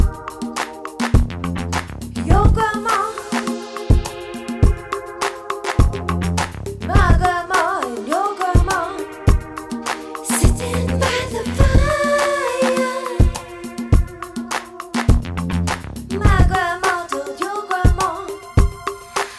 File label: mixed